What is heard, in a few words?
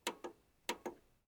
dvd player buttons; prosoundfx